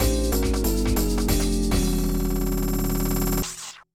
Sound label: Lose; G-Taste Mahjong; ps2